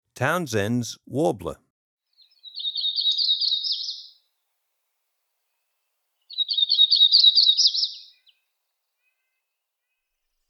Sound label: Townsend s Warbler; John Neville